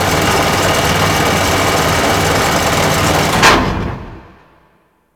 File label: Ice Hijack Door Open; Warframe; Corpus